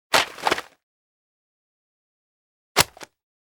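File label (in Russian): книгу бросили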